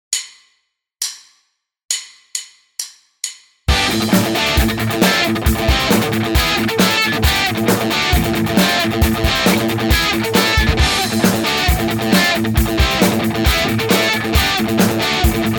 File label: Riff, guitar; Pickipedia: learn to play 23 rhythm guitar riffs